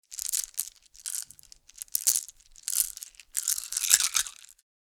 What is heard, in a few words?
Game tokens moving clattering in hands